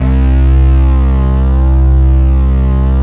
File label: BASS, Drum & Bass 150 BPM; Ueberschall; Drum & Bass Flash Trackz